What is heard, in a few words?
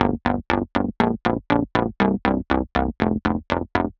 Rough Bass; Sonic Foundry (Sony Creative Software); The Electronic Music Manuscript Collection; Bass Foundations